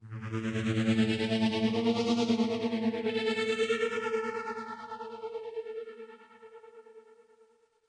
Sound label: synth; Far cry